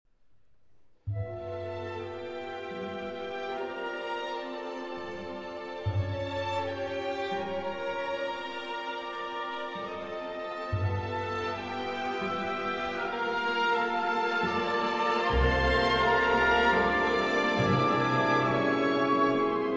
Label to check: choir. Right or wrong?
Wrong